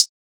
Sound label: Closed Hihat Metal; Drum One Shots